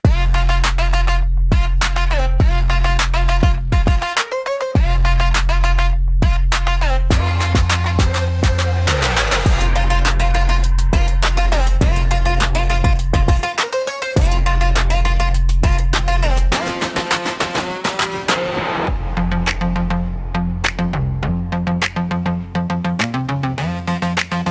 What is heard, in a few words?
electronic hip hop beat; produccion musical futurista para artistas y streaming